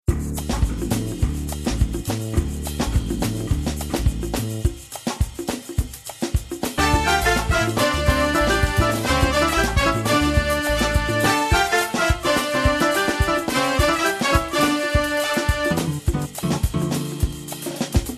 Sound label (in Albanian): Muzik Shqip